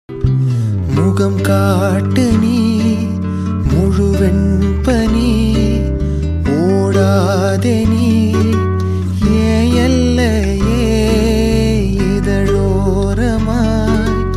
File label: tamil songs bgm; kerala mallu